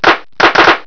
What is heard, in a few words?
KLAP